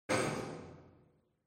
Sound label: warehouse brick drop; Half Life Alyx; rocks